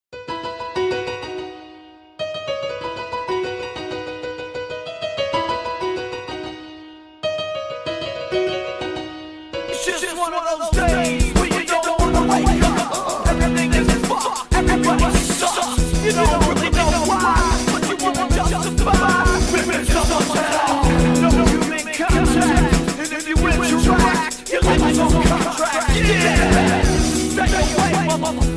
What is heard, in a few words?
piano car; cave